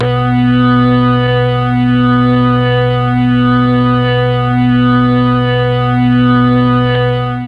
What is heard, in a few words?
B1 organ, MKII mellotron